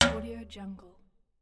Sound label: metal pipe impact